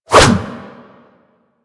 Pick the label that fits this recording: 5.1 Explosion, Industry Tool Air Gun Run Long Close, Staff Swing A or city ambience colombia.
Staff Swing A